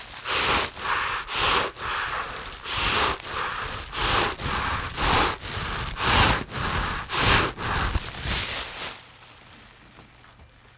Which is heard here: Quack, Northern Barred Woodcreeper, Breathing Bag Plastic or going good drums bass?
Breathing Bag Plastic